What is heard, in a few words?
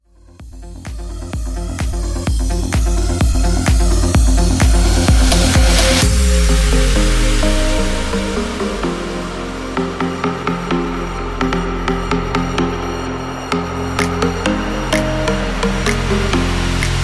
Epic Mashup 128Bpm